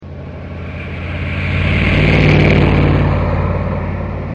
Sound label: Truck